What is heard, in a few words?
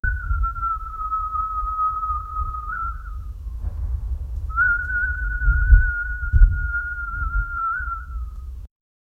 a lone human whistling a; western